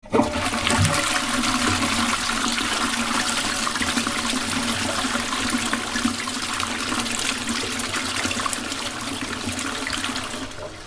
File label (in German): klospuelung